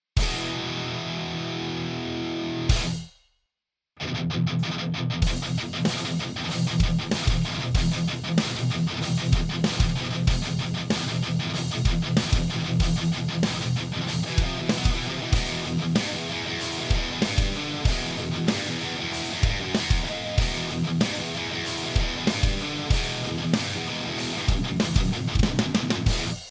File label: HIGAIN, guitars